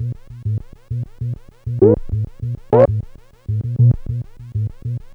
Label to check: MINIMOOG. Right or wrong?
Right